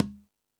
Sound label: Latin Drums, E-MU Pro-Cussion